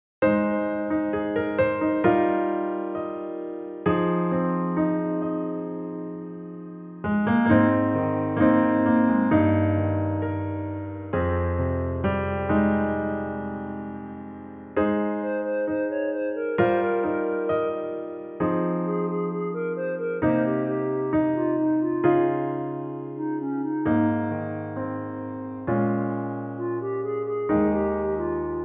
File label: Acoustic